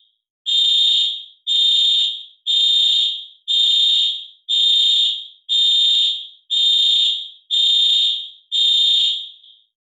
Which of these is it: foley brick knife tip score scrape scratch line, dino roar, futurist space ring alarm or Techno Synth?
futurist space ring alarm